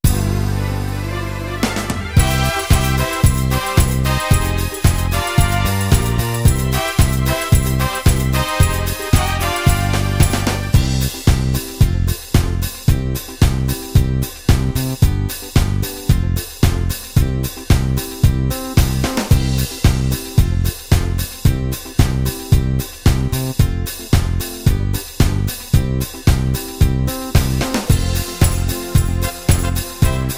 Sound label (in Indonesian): Instrumen